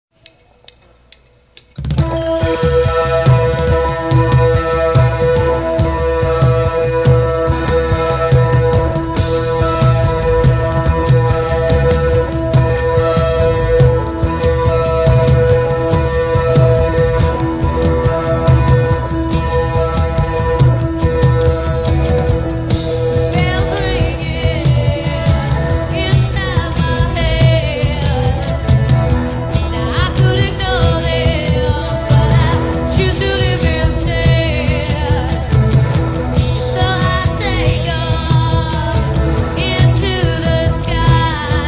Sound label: bells ringing; studio demo; recorded